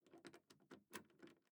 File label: lockpick